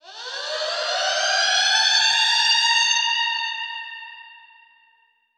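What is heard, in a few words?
alarm siren, echo processed, 170bpm; musicradar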